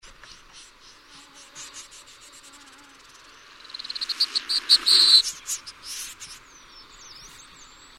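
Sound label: Gallinago stenura; Kahlaajat kurpat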